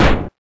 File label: Door kick; Cruelty Squad